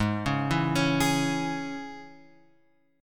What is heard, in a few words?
G sharp Suspended 4th Sharp 5th G sharp; G#sus4#5 Chord {4 4 2 X 2 4} arpeggiated